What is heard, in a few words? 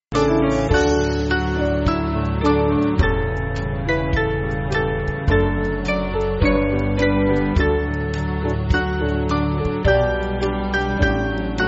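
Band, Backing Files for Churches; A Gladsome Hymn; The Blessed Name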